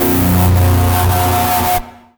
E Guitar; Blackie6; pitchglitch

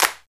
Clap Dry

